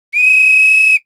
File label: game whistle